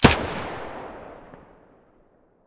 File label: mp5 muzzle mono, weapons